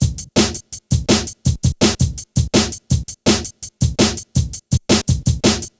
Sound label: Hard Stepz, Jungle, Drum Loops; 165.5; Zero-G Jungle Warfare 1, 2 & 3; 90 s Sample CDs